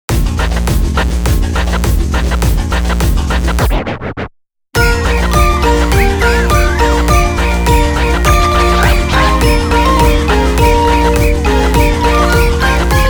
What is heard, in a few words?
Dubstep; Square Enix Music Sampler CD Vol.14